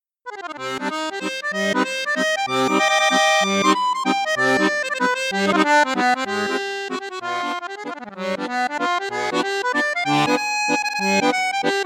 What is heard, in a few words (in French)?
registre flute juste, accordeon; piermaria 315